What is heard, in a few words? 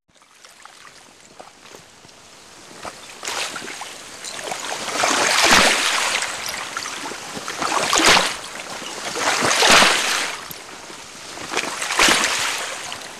Small waves